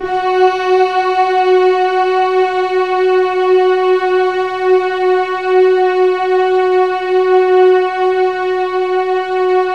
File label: Keypad; Standard Instr; Keypad 2